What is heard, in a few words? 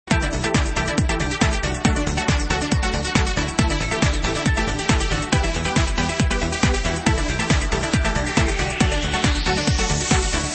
Trance Party Mix